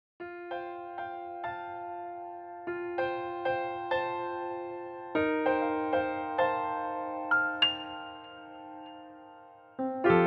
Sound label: pianokaraoke